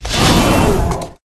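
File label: Dragon Skeleton dodge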